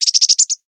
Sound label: Random Chickadee